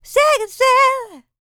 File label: LICKS, Vocal R&B Dance; LT DANCE